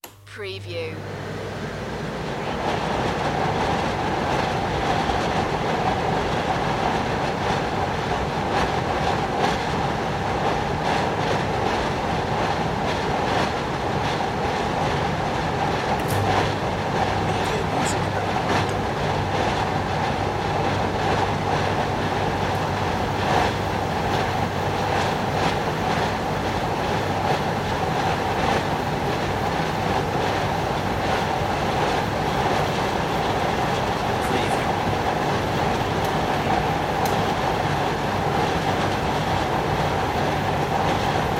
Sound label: mobile air conditioner